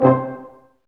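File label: BRS, Brass Ensemble stac; Roland L-CD702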